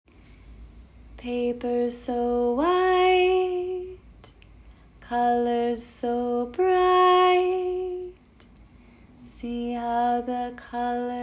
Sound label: Painting song